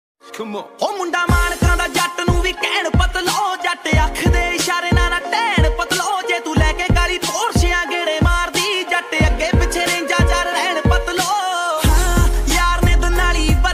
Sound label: ringtone punjabi song